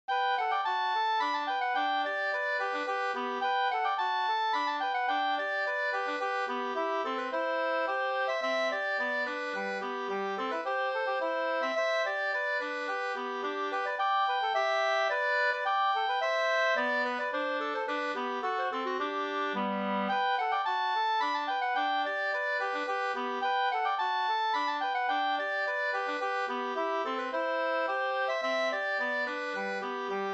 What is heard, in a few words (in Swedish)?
slängpolska